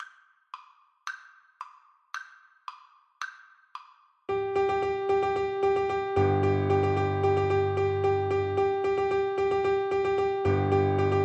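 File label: viola karaoke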